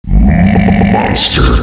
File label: monster